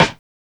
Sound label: RIM